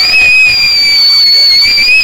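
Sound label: saxophone, BRASS KIT; Big Beat Samples & More; AKAI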